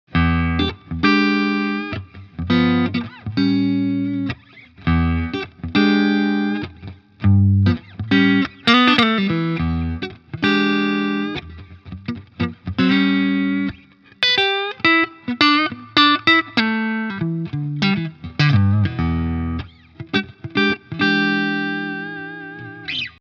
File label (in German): Fender Squier Bullet Strat Hals clean